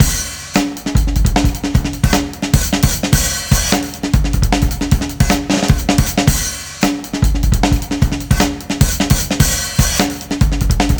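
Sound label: drum, 153bpm, Breakbeat; Breakbeat 2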